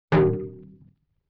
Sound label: LoFi Tom Hit; Vintage Cartoon; Drums & Percussion